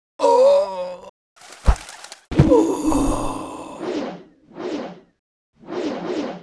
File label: Mob die; 신마비영